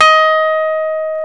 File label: PNO, Rhodes Mk.V; Keyboards of The 60's and 70's